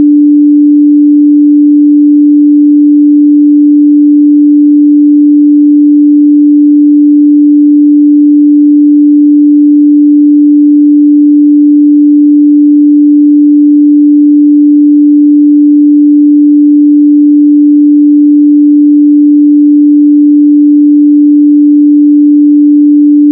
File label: violin; настройка